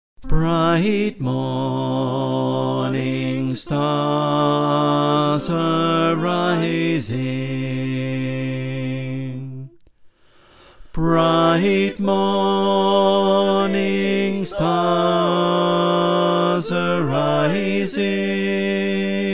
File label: Bass